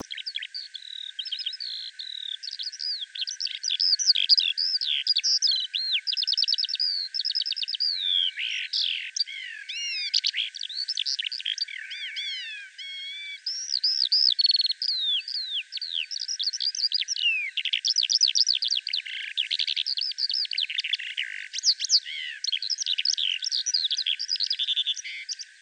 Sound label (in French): Chant d'alouette des champs